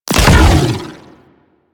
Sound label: Riot Moa Disc Fire; Spec Ops Riot Biped